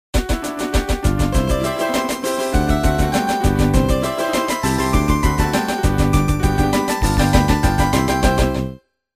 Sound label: backing